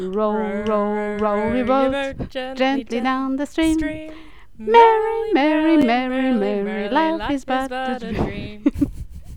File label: mixed 250ms delay